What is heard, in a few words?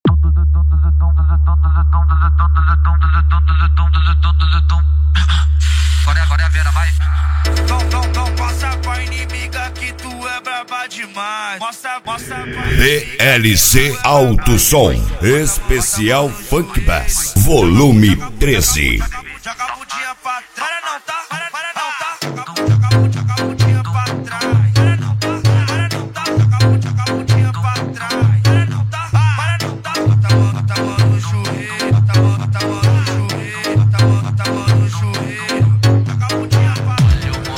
funk bass